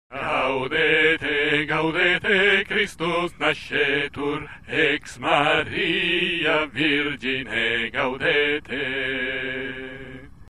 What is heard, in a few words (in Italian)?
Gaudete Tenori; Gloria in excelsis